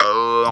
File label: "Ououuu", Funky Vocals; USB Soundscan vol.18; TB MELODY